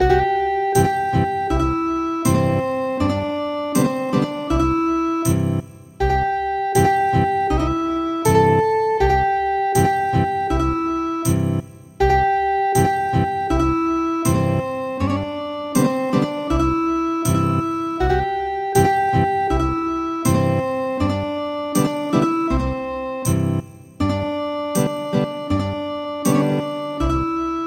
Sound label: Ethnic Guitar; Chick